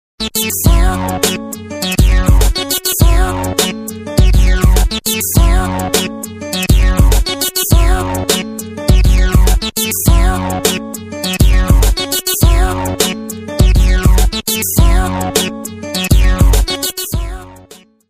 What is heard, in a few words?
American Life Loop